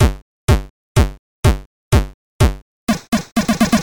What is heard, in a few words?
Commodore 64 (SID 8580) Drummer Drum Kit; Commodore 64 (SID 8580) MSSIAH; BEDROOM PRODUCERS